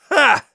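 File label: laugh short, German; Scout; Kurzes Lachen 3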